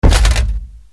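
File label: weapon sword deflect armor; conan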